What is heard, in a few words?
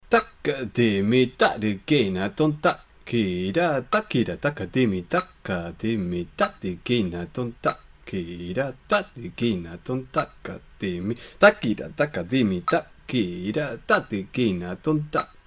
random change of gatis